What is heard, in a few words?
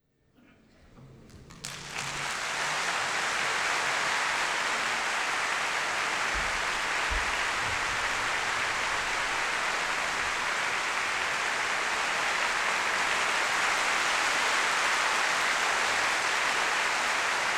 Applause; Beethoven; Symphony No. 9 in D Minor, Op. 125